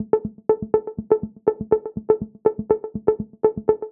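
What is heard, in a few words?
122 BPM Sequence A major G minor Tonic F; innovative sequences 1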